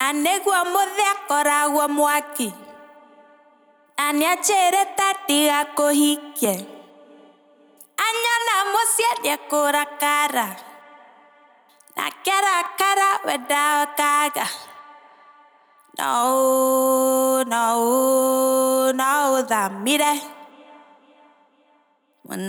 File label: AFRO Vocal Loop 120 G min Wet; Wimbo